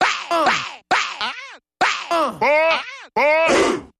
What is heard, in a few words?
R & B Vocal Variation